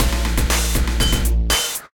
Scorched Kit, Drums; Ableton; Digicussion 2; Scorched Kits

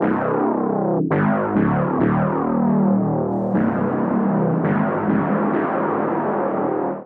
Pad C Minor 136 bpm, Bassline; MIDI Clips; Tonal; Ovina; Skitter and Step; Ableton Factory Packs

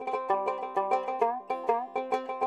Indian Do Tara C, Dotara Guitar; World

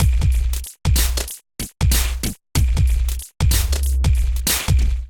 Kimbo Kit groove 94 bpm, Drum Essentials; MIDI Clips; Ableton

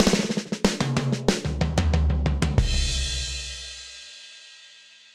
OldSchool 93bpm; End; Clockwork; Ableton; Factory Packs; Session Drums Studio

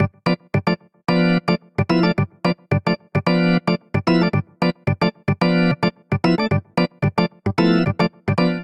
Vamp Discofunk Organ G7 111 bpm; Electric Keyboards; Ableton; Factory Packs

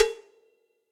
Cowbells Multirod Dry; Drums; Drum Hits; Ableton; Session Drums Studio; MPE Hits

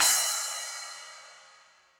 Splash 10 Warm And Low, Cymbal; Drums; Drum Hits; Standard Hits; Ableton; Factory Packs; Session Drums Club

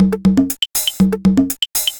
Conga Perc, Vector Wave Sequences; Ableton; Retro Synths; Components

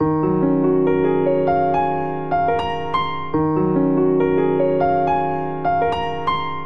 Ostinato Free Eb Minor 72 bpm, Grand Piano; MIDI Clips; Ableton

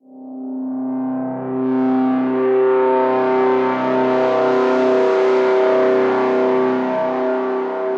MPE Feedback Drone; Experimental MPE; Laboratory

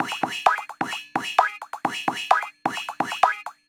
Drumkit; Ableton; Factory Packs

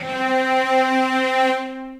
Cello Section Legato; Ableton; Orchestral Strings

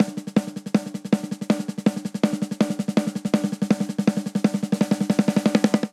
Punk Rock Snare Only 162 bpm; 4 4; MIDI Clips; Ableton; Factory Packs; Drum Booth

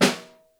SN1 Room, Snare; Ableton Foundation FreePacks; Snare Designer

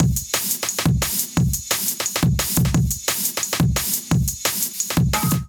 Kit 175 bpm, drums; MIDI Clips; Mad Mollusc Kit; Ableton; Factory Packs; Skitter and Step

